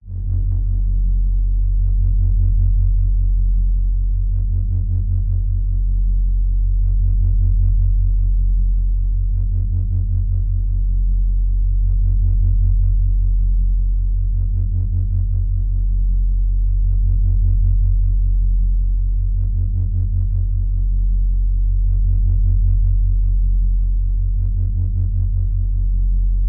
science fiction force field pulsating hum drome lfe